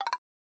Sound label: pull; IceCubesApp